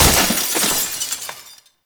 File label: Glass Window Break; Warframe; Nineteen Ninety Nine